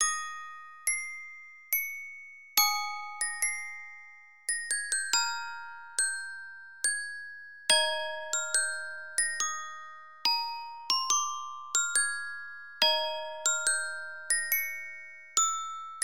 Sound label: Sarabande, Musicbox; katawa shoujo beta version gamerip; BWV1010